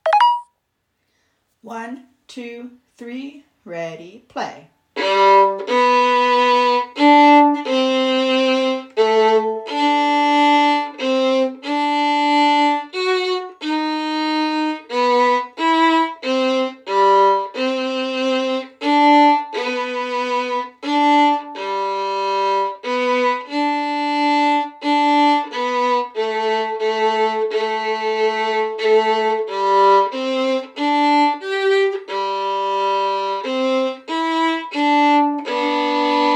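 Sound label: Wish You Violin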